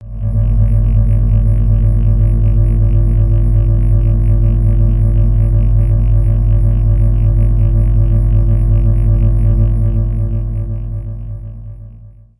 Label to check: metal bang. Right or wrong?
Wrong